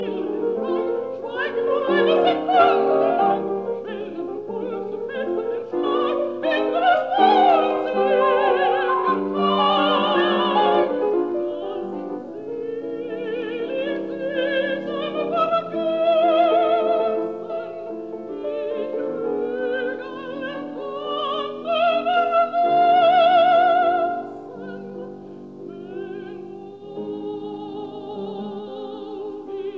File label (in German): fur eine Frauenstimme; Wesendonck Lieder; Stehe still; Stand Still